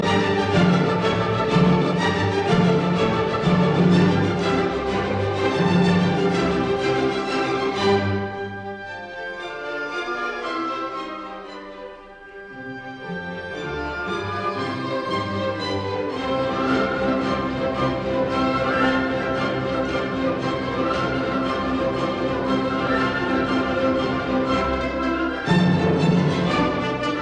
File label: Symphony in D minor Choral Molto vivace; Symphony No 9; Op 125; Bruno Walter